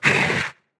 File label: attack; Barotrauma; Crawler